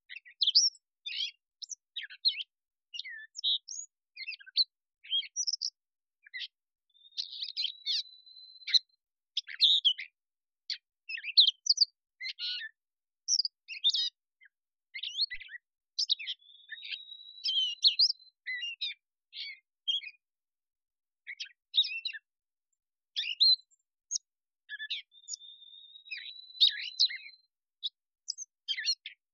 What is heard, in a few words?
Catbird